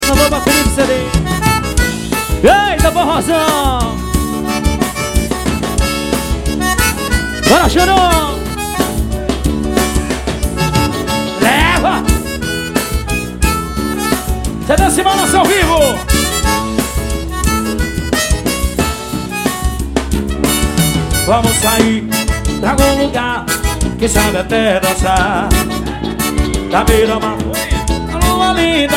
forro dance e balance, forro xote

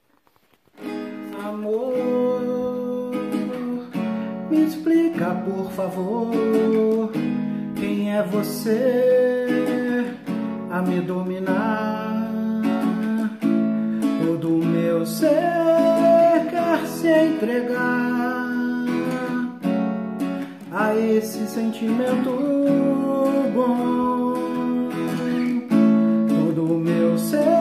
versão simples para violão

